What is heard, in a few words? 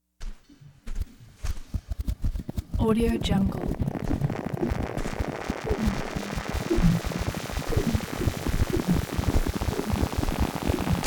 tension wing whooshes chaotic